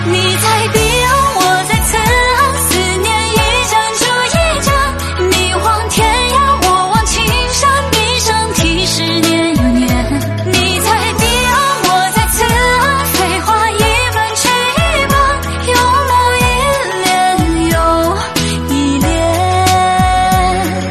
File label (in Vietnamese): Tuong Tu Ngan Phi Loi